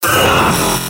scout pain severe; vo; tf2; mvm

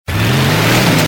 start, truck; brrr truck; sentry; manster

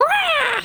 skelly small

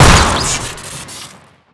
pistol crit; weapons; tf2

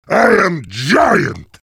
cheers; heavy

